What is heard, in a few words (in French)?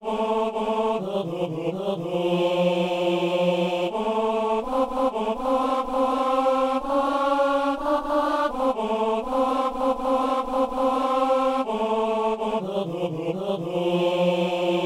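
ténor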